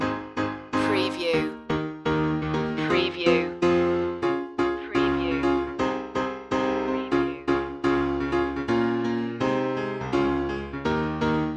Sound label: a new day has begun loop